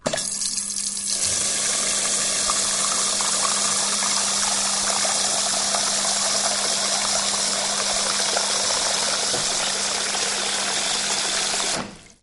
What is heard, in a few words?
dental